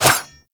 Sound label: Dagger Whoosh; Dual Daggers; Weapons